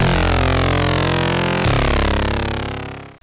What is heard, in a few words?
Evil Checkpoint; Speed Bump